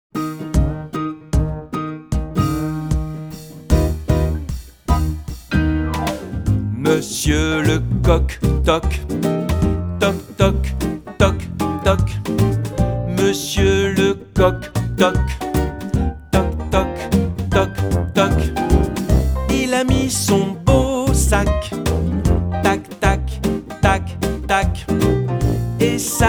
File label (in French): toc tac tic, comptines